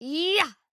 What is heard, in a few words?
Attack Medium